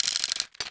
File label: clip spin; taunt; Garry's Mod; tf2